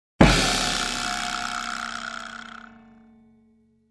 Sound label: Bass Drum crash